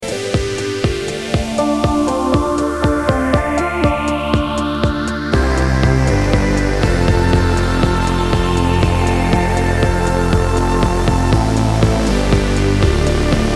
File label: Music box; Revolution sampler